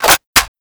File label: g3 reload clipin